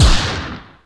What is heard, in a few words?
Sidefire Overdrive; D3DEMO; Vause Sidefire D Overdrive